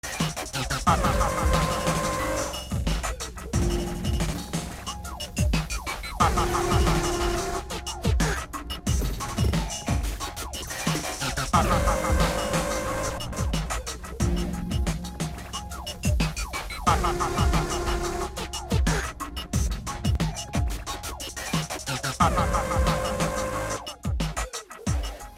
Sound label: ahuhuhuh